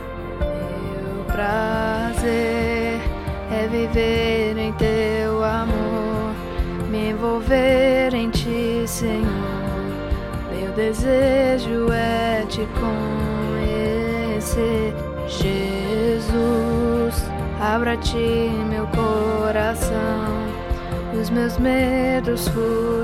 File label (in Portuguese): tenor